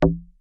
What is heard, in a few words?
bonk